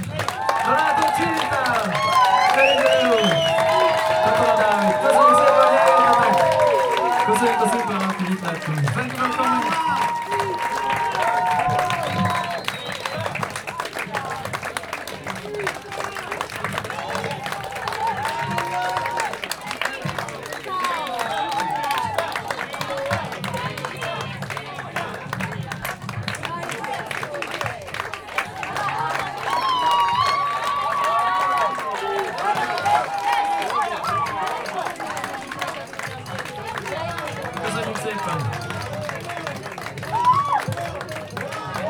koncertet záró ováció, romkocsma; szimplakert

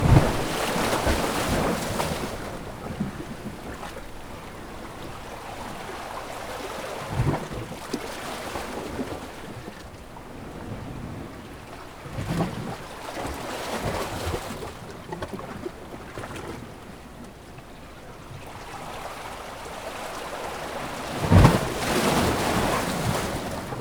vizes fülbe mászó érdekes hullámhang hatás

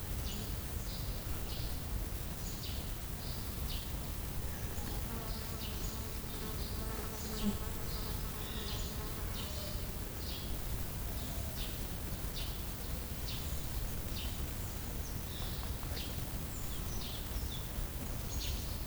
nandu; miskolci zoo 2009

